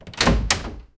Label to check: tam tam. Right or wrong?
Wrong